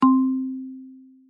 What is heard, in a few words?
Call HangUp; GrabCall